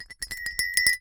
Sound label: Cowbell Chain, Percussion